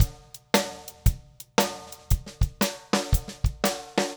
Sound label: 115 Pop Drums; Pop Rock; Fast Tempo; Digidesign; Pro Tools; Pro Tools Essential Loops